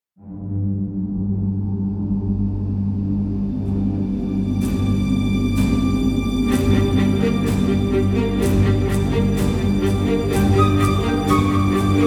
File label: Music